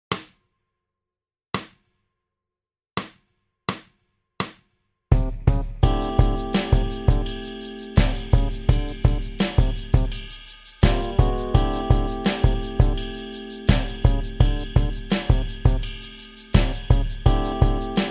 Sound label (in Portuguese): partido alto